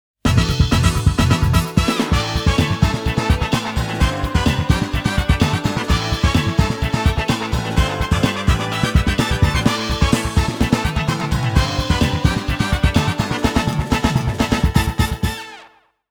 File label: Today's Best Goal BGM